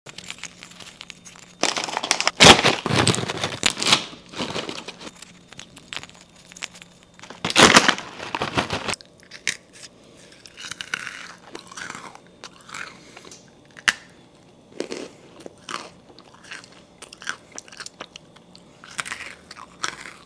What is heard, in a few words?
Freeze-dried ice cream, خوردن بستنی خشک